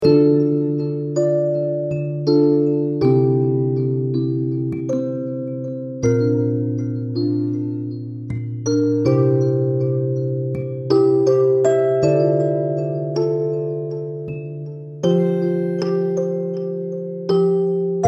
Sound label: Healing Melody